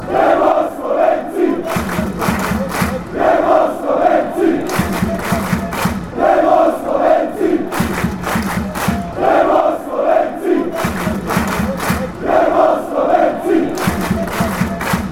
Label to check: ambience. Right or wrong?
Wrong